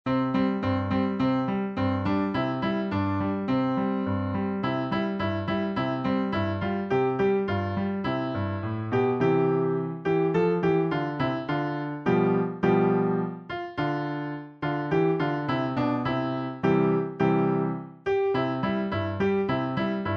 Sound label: grandpas farm instr